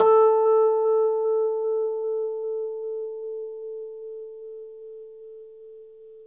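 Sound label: Rhodes, A3, left; 3D Sound; 3D Keys VOL-1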